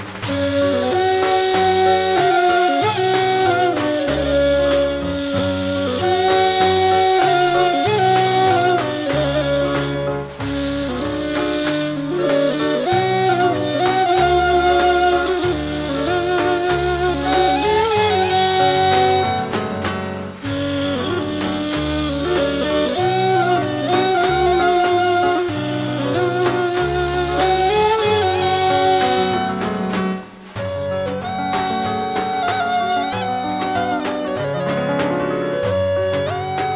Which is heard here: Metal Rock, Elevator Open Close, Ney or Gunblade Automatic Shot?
Ney